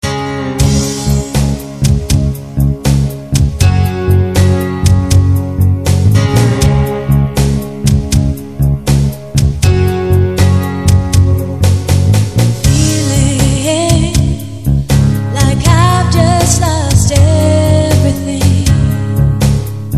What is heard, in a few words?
summerrain